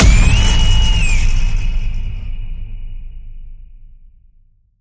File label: Phoenix Screech Hit